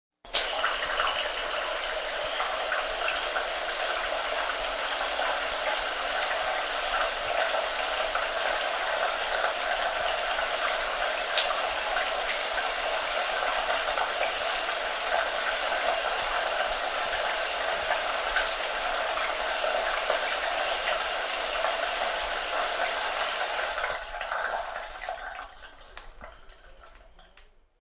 Shower Running 4 Hard w Fine Spray Then Turn Off, Household